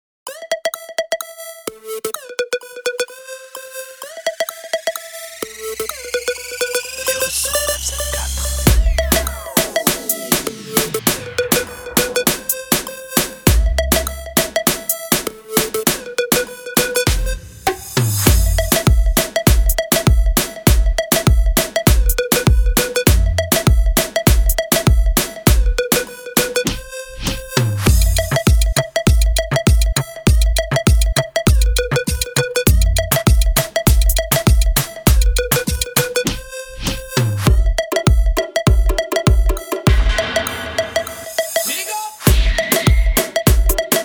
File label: Streetfight Melody